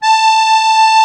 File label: HOHNER, AKKORDEON; Best Service; Alpine Volksmusik